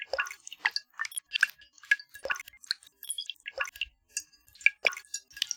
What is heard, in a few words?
waterdrops; best service Blue Box; water fx